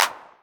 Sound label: Clap, Novation Drumstation